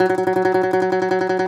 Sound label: DOMRA, Segundo Domra, Plucked Strings